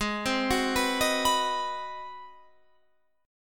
G sharp 7th Sharp 9th G sharp, down, Guitar, Open F; arpeggiated; G#7#9